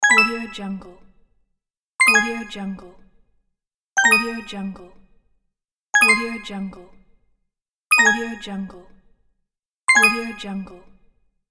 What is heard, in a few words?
collect jewel